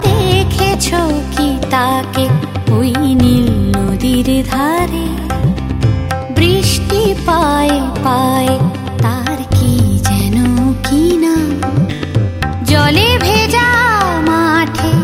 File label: Most Romantic Ever